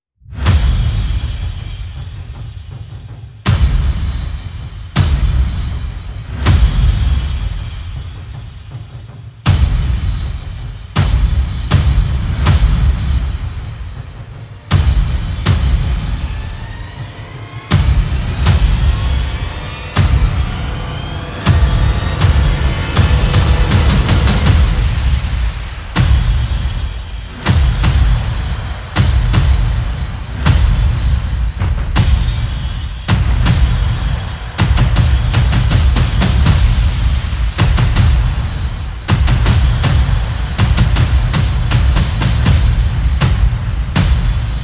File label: Perc and FX